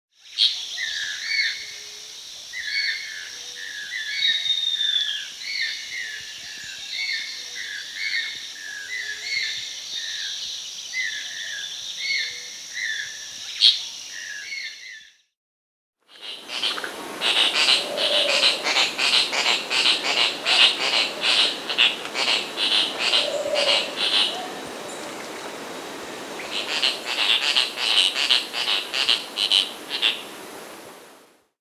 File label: Masked Tityra (Tityra semifasciata), Bolivia; S. America